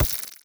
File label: step layer, headcrab black movement